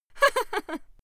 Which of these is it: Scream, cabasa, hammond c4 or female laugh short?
female laugh short